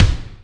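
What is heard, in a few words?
kick Thrash, Alesis D4 drum machine; 300 Drum Machines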